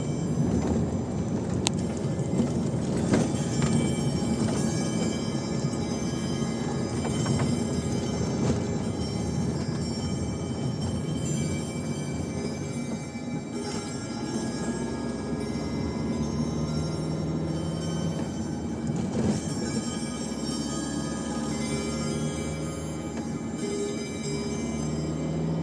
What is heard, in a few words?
bumpy bells clock in car on country roads